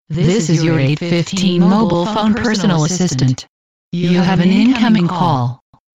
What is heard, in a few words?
Incoming call